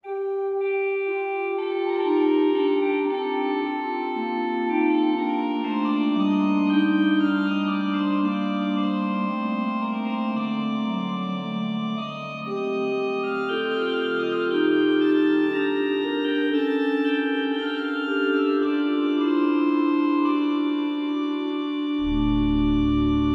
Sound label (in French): Voix humaine, organ; Organteq